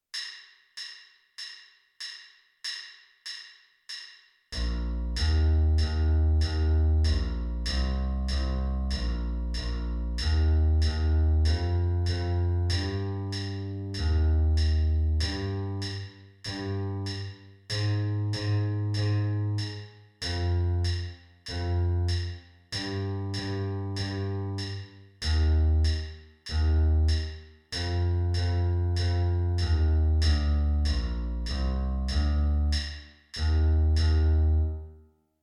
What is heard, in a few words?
electric bass